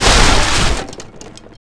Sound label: toilet; World of Tanks Blitz